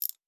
Lockpicking; Survival Game Sounds - Lite Edition; Doors

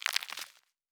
Rock Small Debris; Survival Game Sounds - Lite Edition; Environment

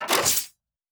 Trap; Survival Game Sounds - Lite Edition; Combat and Hunting

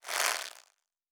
Bow Draw; Survival Game Sounds - Lite Edition; Combat and Hunting

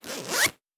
Zipper; Survival Game Sounds - Lite Edition; Objects and Items

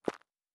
Dirt Run Surface, Footsteps

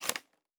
Arrow Handle; Survival Game Sounds - Lite Edition; Combat and Hunting

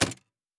Build Interface

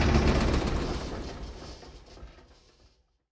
Engine - Stop; Survival Game Sounds - Lite Edition

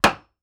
Nail Wood, Crafting; Survival Game Sounds - Lite Edition

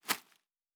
Leaf Run Surface, Footsteps

